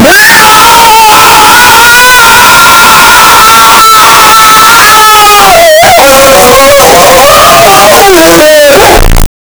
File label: two guys are screaming, vomit